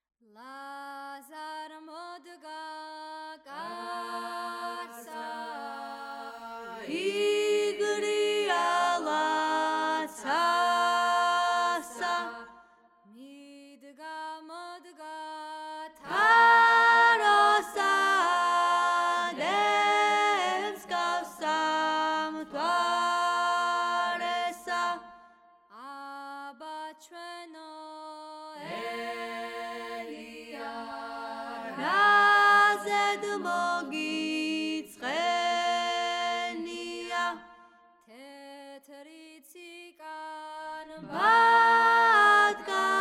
ლაზარე ქართლური პირველი ხმა; გალობის უნივერსიტეტი; სასწავლო

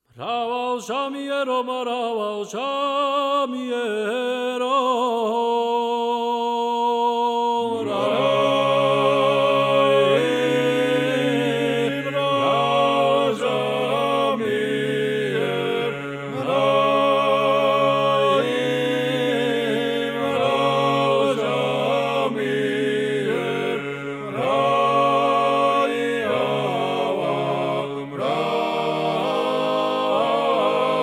ასლანური მრავალჟამიერი რაჭული; სასწავლო; გალობის უნივერსიტეტი; ვაჟები

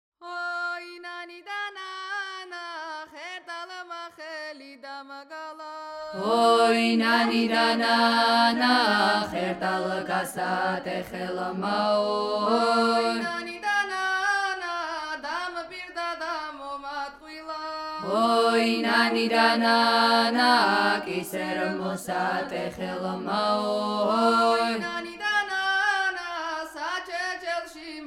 ხერტლის ნადური აჭარული მესამე ხმა; გალობის უნივერსიტეტი; სასწავლო

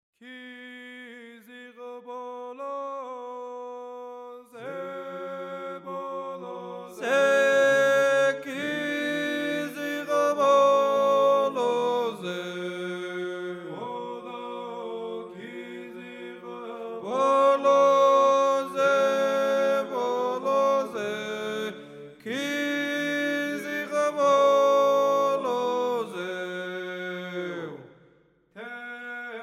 ქიზიყ ბოლოზე მოხეური პირველი ხმა, ვაჟები; სასწავლო; გალობის უნივერსიტეტი; სასწავლო ჩანაწერი – I ხმა

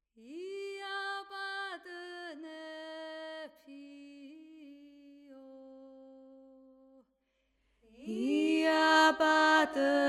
მეგრული მეორე ხმა; ია პატონეფი; გალობის უნივერსიტეტი; სასწავლო

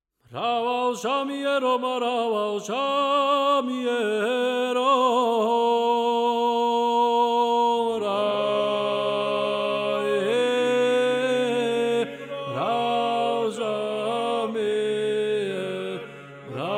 ასლანური მრავალჟამიერი მეორე ხმა

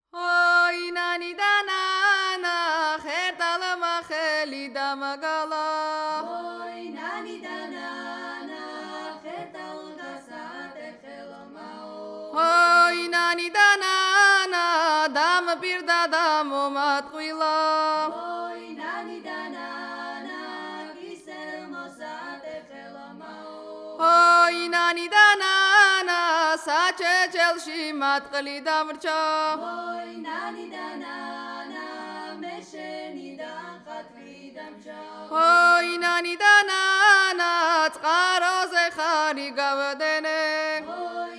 ხერტლის ნადური აჭარული მთქმელი; გალობის უნივერსიტეტი; სასწავლო

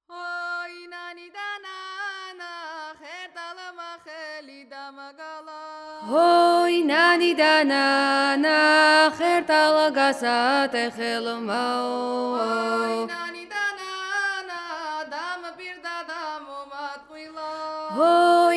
ხერტლის ნადური აჭარული მეორე ხმა; გალობის უნივერსიტეტი; სასწავლო

